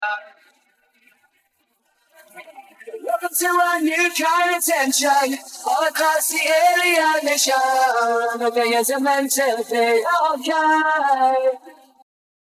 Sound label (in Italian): solo voce